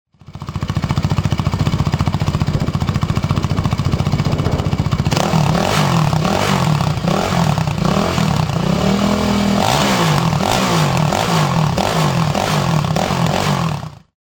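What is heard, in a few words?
trx450r, exhausts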